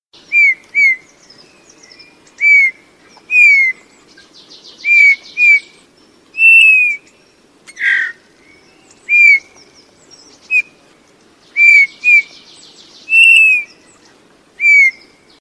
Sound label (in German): Dompfaff